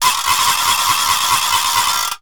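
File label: noise maker; KABOOM! - Wild and Wacky Sound Software; music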